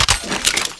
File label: missile on, ballista; tfa cso